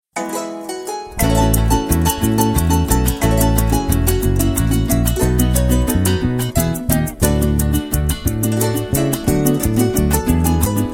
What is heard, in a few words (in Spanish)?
Llanera, ringtones; Vtelca; V769M